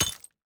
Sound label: wither beast crystal impact